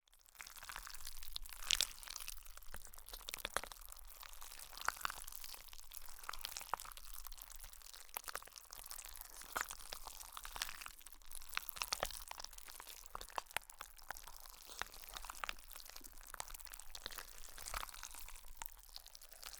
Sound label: Flesh - Slime Sound; Lewd SFX